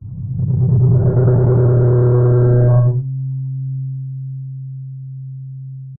Whoosh Fly By Pass By Sci Fi Abstract Movement Flutter Overload Sudden Stop; skyes audio